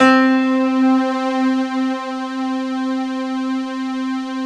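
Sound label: Piastr, piano strings; Best Service Gigapack 1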